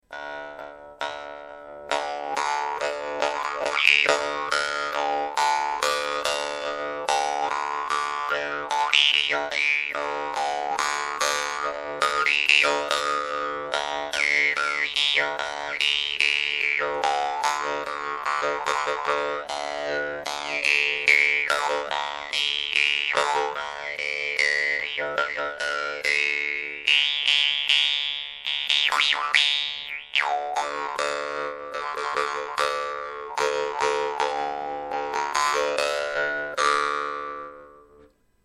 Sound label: vargan; russian vargan ustinov small